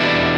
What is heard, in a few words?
Em7(11)